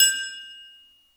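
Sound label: triangle half open, Percussion; Sonatina Symphonic Orchestra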